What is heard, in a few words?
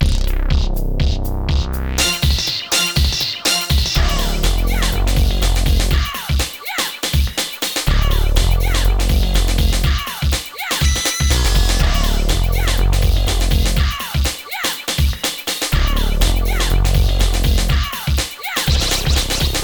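music fight legacy intro; SND MUS Street; Mortal Kombat Komplete Edition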